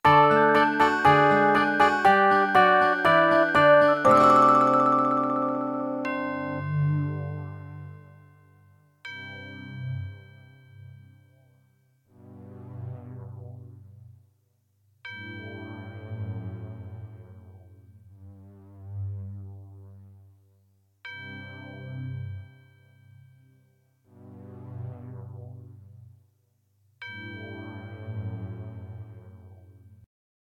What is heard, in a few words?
draw; Pikmin2 FF